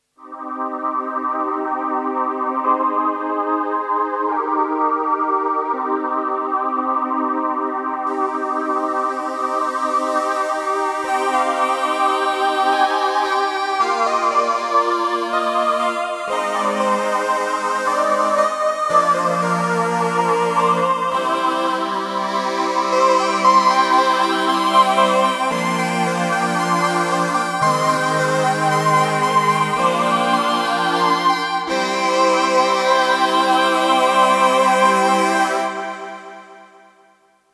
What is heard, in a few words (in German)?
Opus 3 Chor mit Phaser